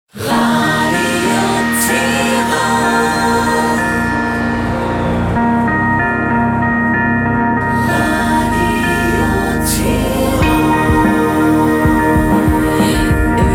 Main Theme Slow Rock

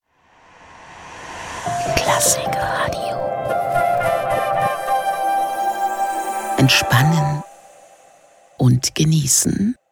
Station ID

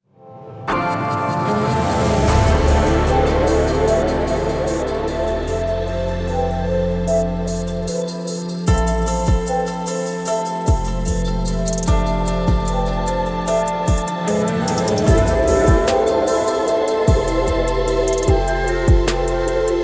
Backtimer Music Theme; ARD Infonacht

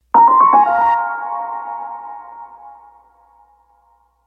Sound Logo Info mini; MDR S AN

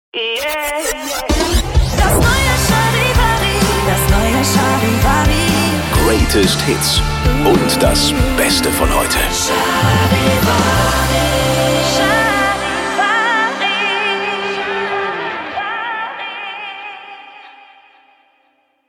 Transition Hot to Soft New Rock fast; Charivari

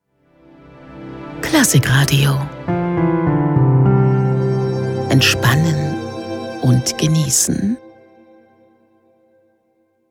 Station ID

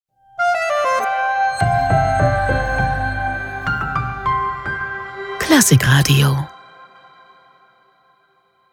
Station ID

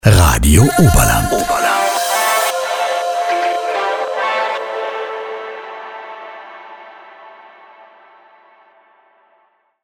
Universal Transition; RADIO OBERLAND

